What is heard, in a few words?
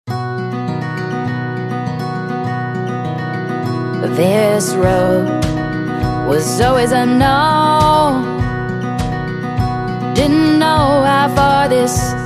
With Vocals